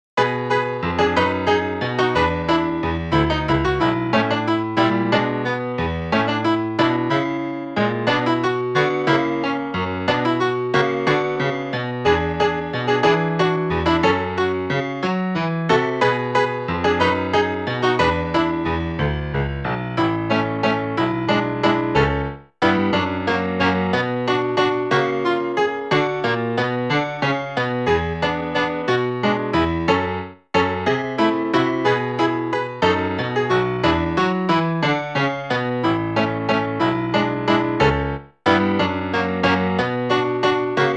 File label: just Piano fast version, karaoke